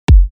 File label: Kick 47Hz F Dual